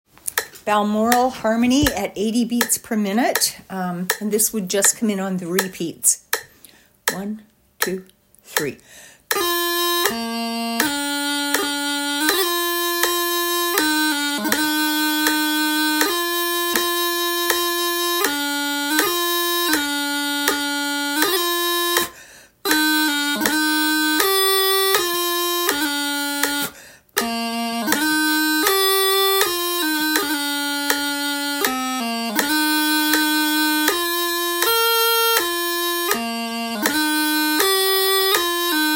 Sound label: Balmoral Harmony 80 BPM, pipers music